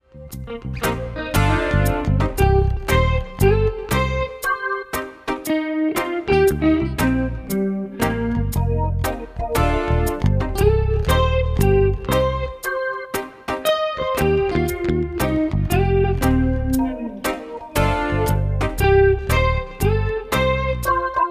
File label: Reggae Rock Shuffle